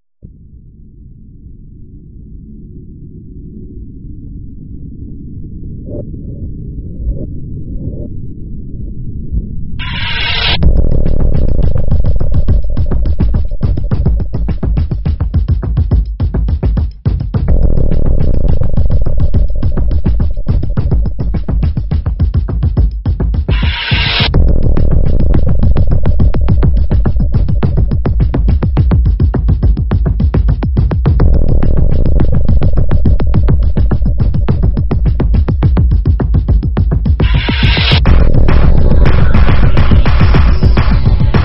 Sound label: speed Metal Drums